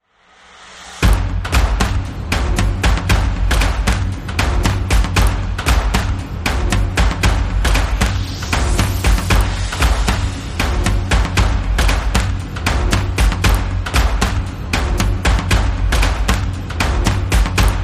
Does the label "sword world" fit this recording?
No